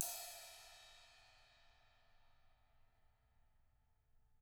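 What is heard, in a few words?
CRASH 16, CYMBAL; CYMBAL KITS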